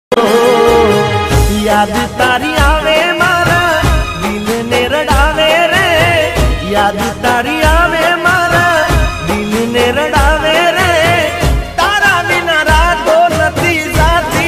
love ringtone gujarati new song gujarati ringtone gujarati instrument ringtone